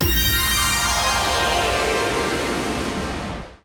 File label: freeSpinStart; jewel fruits